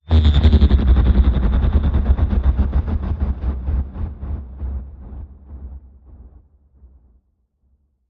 Glitch Stuttering to Stop

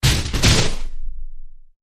Quick Pounder

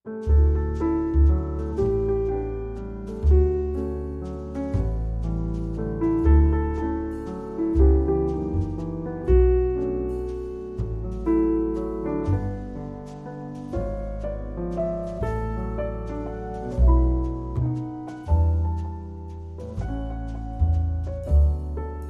With Trio

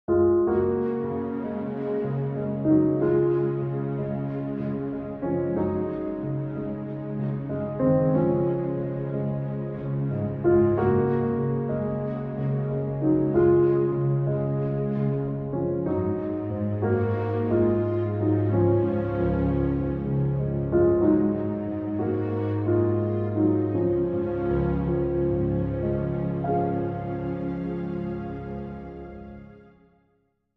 With Strings

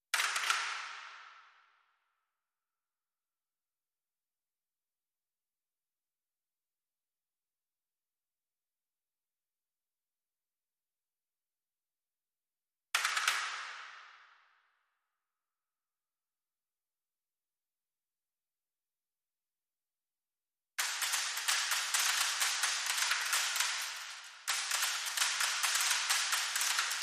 Loop Layer Bamboo

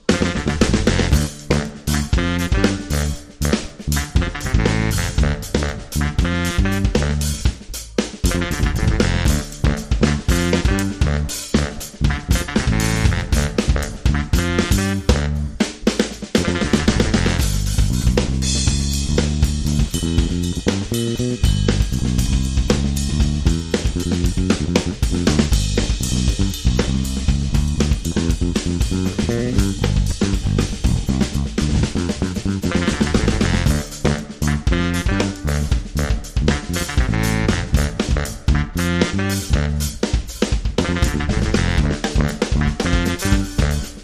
Drum & Bass

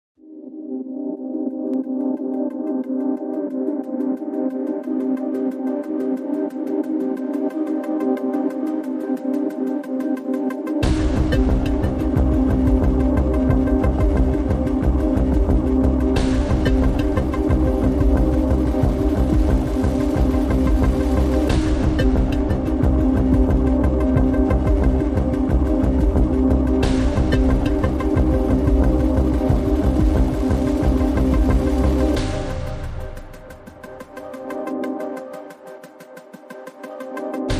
No Whistle Synth

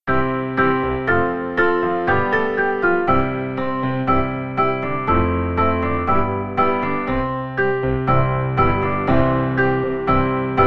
Piano Solo

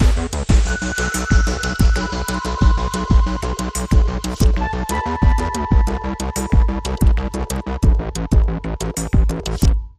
Link Sting

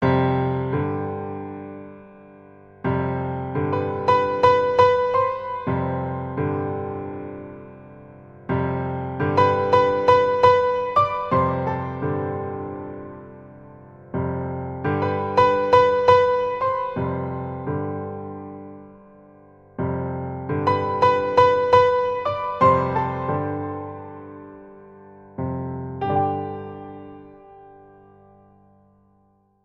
Introspective Piano Etude

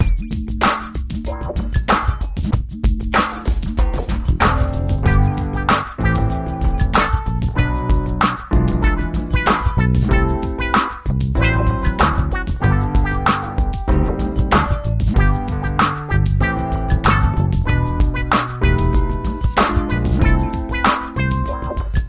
Ohhhh Christmas Tree; Trad (PD)

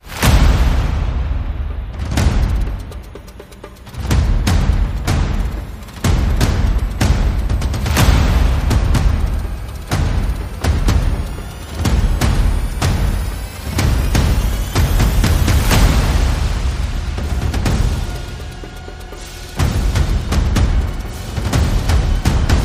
Percussion and Effects

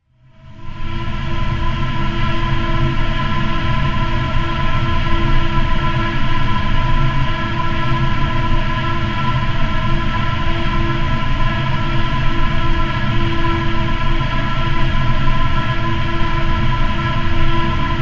Tunnel Drone

